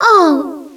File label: ohhh, Echo (inside caves, underground ect.); DS; ML BOWSERSINSIDE; Peach